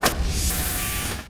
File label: spaceship door open